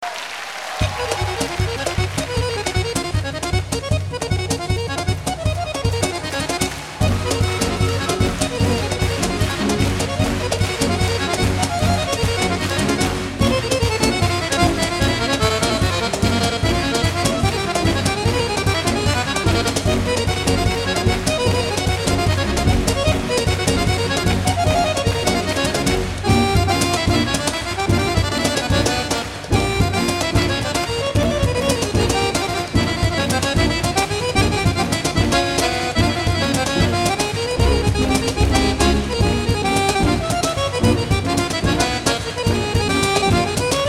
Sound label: Deep Folk Song